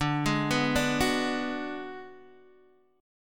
D 6th Flat 5th D down Guitar DADGAD, chord

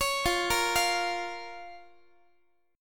F Suspended 4th Sharp 5th F, Guitar; Fsus4#5; arpeggiated; x,x,16,15,14,16

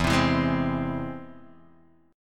E Augmented, Guitar, Drop D

